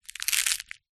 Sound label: insect caterpillar bite eat leaf; animals